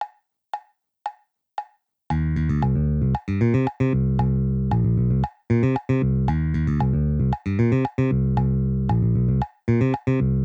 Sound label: Ebony jam